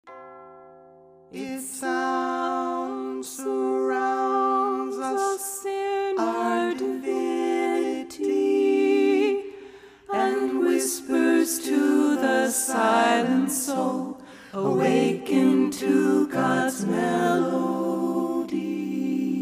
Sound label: melody